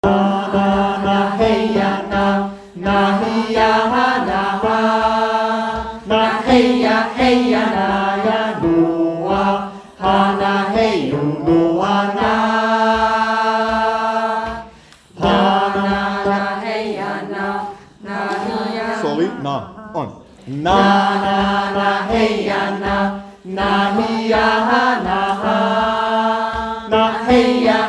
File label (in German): Sopran 1 und 2